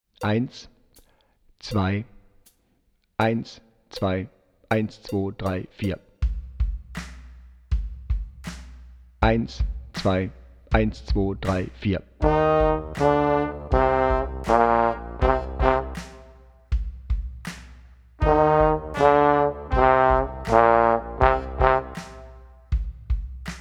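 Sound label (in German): Power Rock Playback Posaune Lauter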